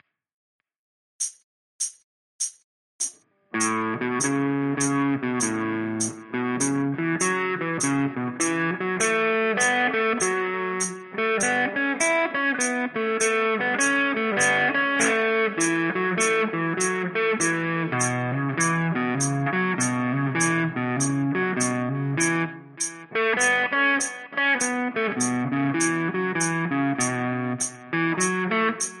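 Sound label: Ground Guitar Solo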